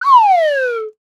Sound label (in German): Lotosfloete down